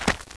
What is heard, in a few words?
dirt